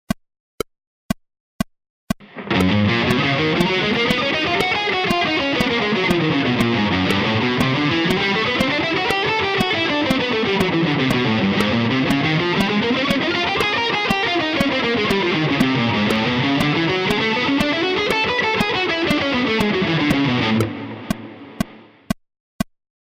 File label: scales combining rhythmic variations fast